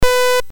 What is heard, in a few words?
MOS6581R3, GAMES